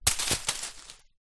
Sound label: impact rock small land amongst leaves bushes